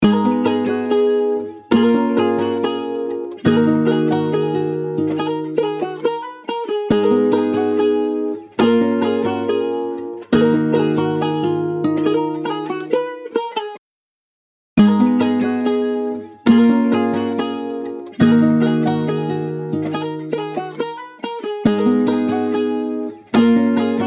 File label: Acoustic Guitar Stack